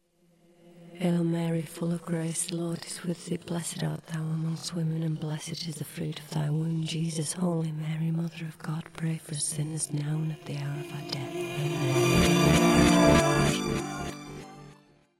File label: reverse, anarcho-punk; backmasking contrario